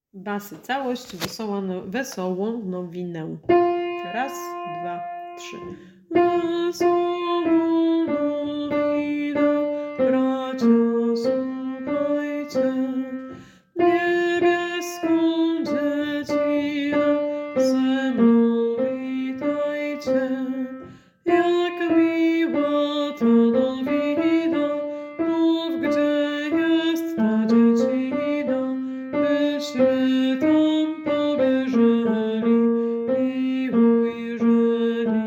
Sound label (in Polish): Wesola nowine bracia sluchajcie Bas calosc; Boze Narodzenie